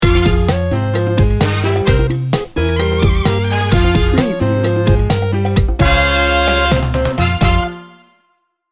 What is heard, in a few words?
sultry chica sting bumper